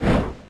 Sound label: Hammer Arm